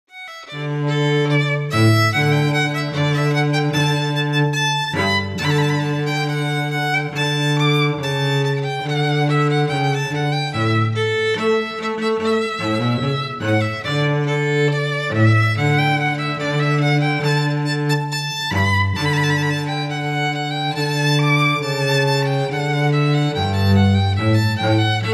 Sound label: rondeau, duo; mouret